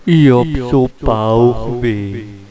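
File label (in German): Bauchweh; 999wavs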